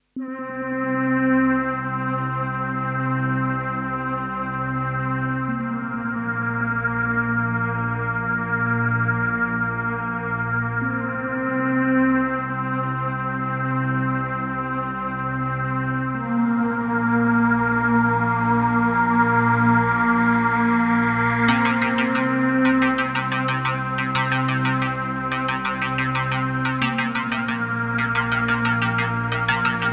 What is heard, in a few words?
strings interlude